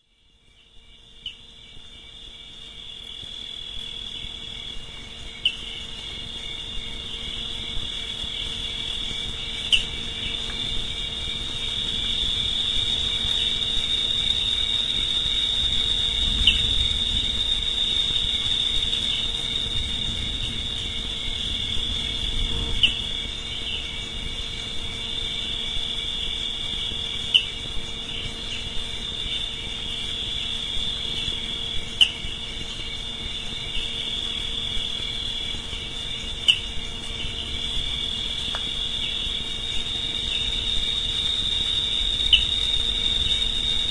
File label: Varying cricket